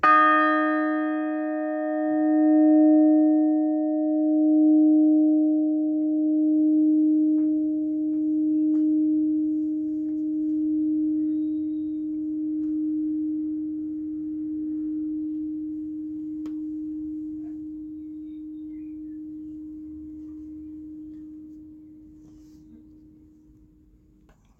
billingshurst three, bells

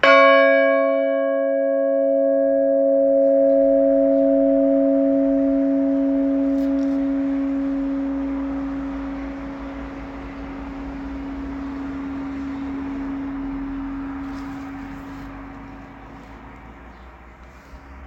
sharp fifth, bells; lower beeding

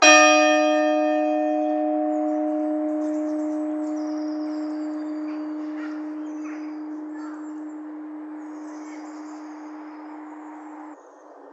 four, bells; copthorne

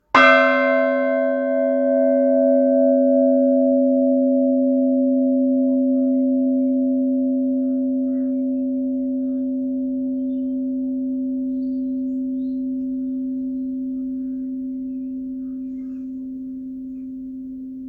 east dean tenor, bells of sussex

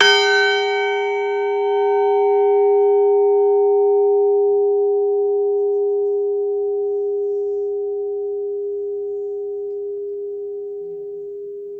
treble, bells; stoughton

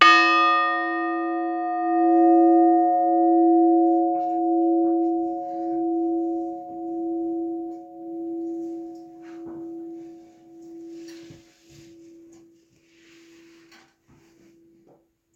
bells, stoughton three

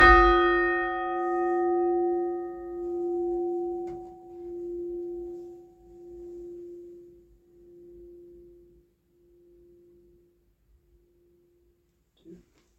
itchingfield two, bells

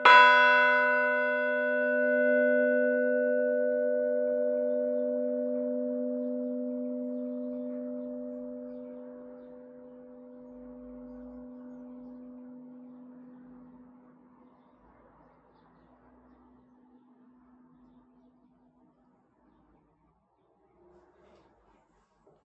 westham, bells

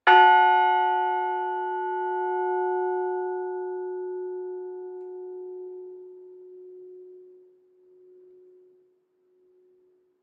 treble, bells; fairwarp

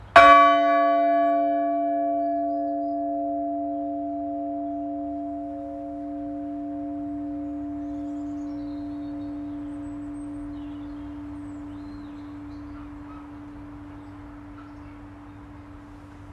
cowfold, bells

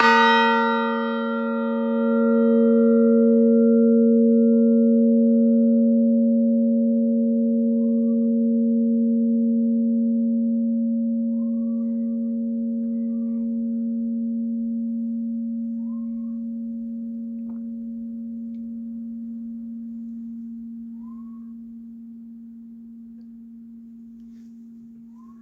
tenor, bells; funtington

